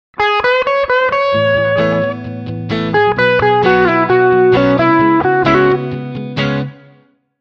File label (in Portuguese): Pentatonica